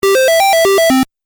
Game Music Phrase, Video Game Sound Effects

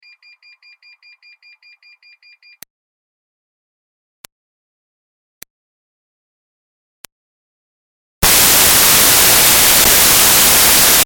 Car Smart Car Dash Beep; 2005 Smartcar Fortwo; BigRoomSound

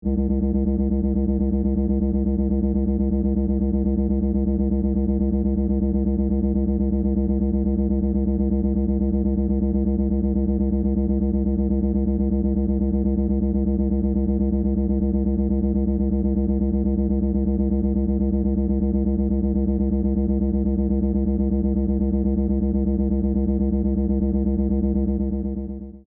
Force Field Energy, Source; Science, Fiction; BlastWave

